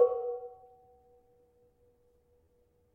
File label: Barung 4 B, gravity harps tuned